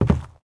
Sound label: zombie footstep wood; DeadFright